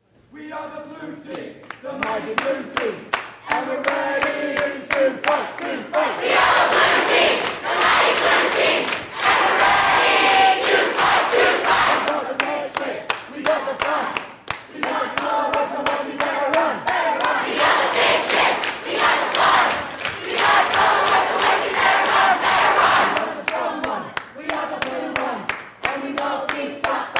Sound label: Cheer; Blue Pirates